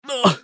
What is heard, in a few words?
usec wound; eft; scales